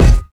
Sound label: physics cube md; Portal 2